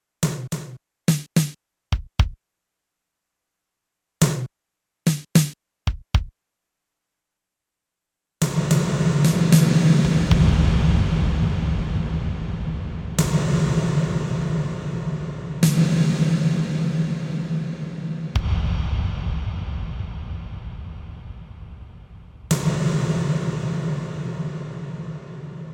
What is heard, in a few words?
5 Sec. LARGE WARM, Alesis MidiVerb